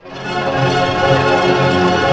ARP C MIN PP, STR ARP; Sound On Sound; Peter Siedleczek's Orchestra

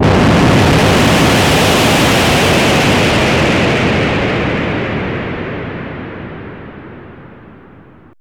big explosion, synth bombs; Zero-G Ambient 1; SYNTH BOMBS1

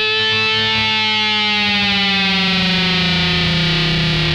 GIANT DIVE, Funk Guitar; 90 s Sample CDs; Zero G

